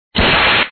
torch; Factory Balls 2; online gamerip 2008

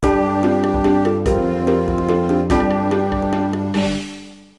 task medium; Fremennik; RuneScape 3

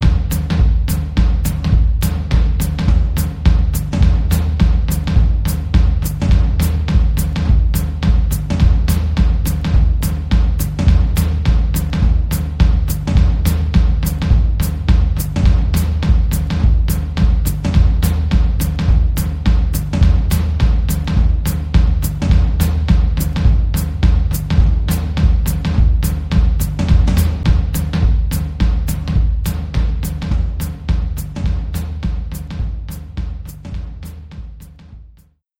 The Canteen Music Pads (Drums)

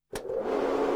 Projector Start

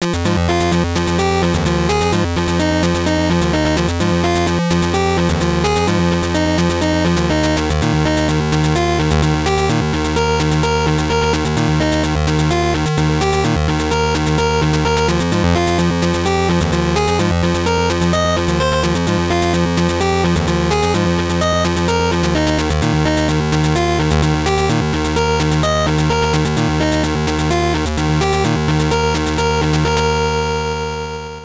BGM; Duke Nukem; GBC

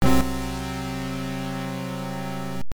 Fail Grade; madou monogatari 1-2-3; pc-9801

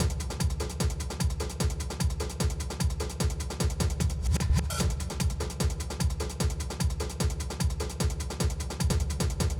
Drum Loop Layer

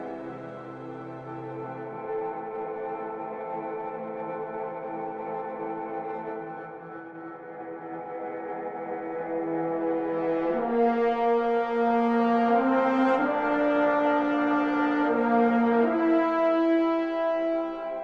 Melody Loop

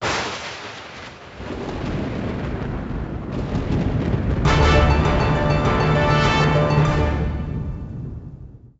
sword in the stone win, pinball; Excalibur

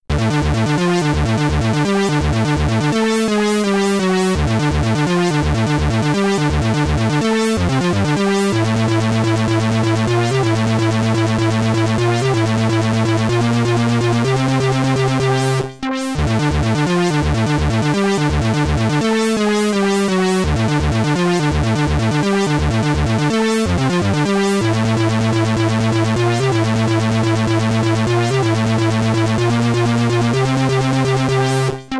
Fight with Instructor, SID Stereo; combat school commodore 64

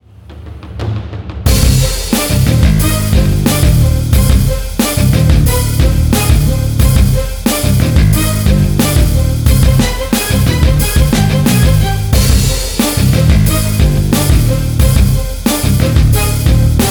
Battle, Action Dramatic Thriller Music; Hilzartov; Fairy Size Doesn't Matter

